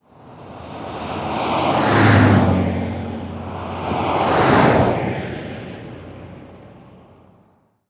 car

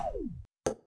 dart throw

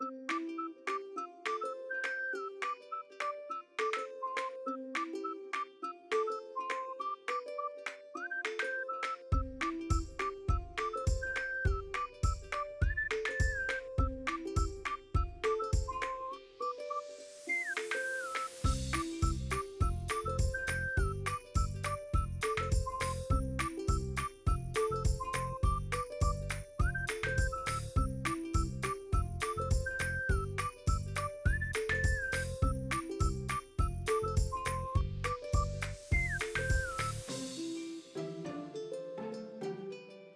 music cheerful; bgm

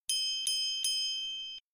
triangle

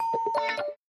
hint answer effect

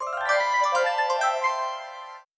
game win

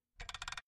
slingshot pull

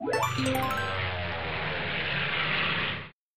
right answer biplane power up

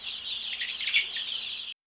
chick

